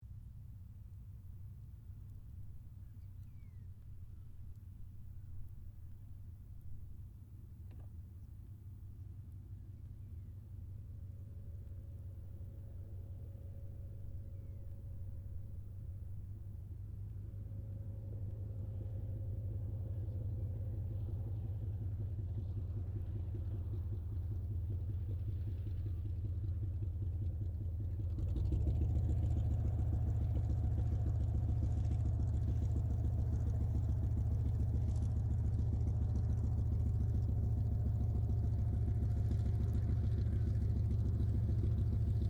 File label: AIRCRAFT CAC WIRRAWAY TAXI DISTANT; CA-16 Wirraway; Airplanes; Vehicles; Aircraft Collection; Twistedtracks